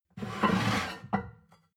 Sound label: plate stack slide table, gastronomie; berufe; soundpool